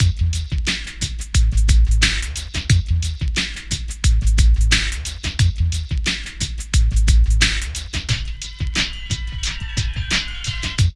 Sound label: PHAT LOOP; E-Lab; X Static Goldmine 2